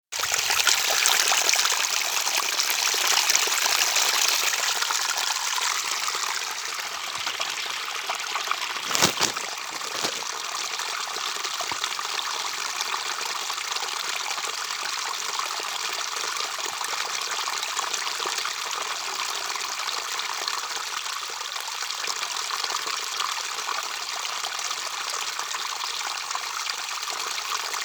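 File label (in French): Cascade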